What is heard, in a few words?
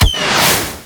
rocket launch tiny